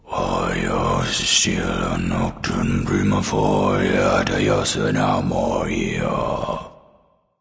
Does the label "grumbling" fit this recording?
Yes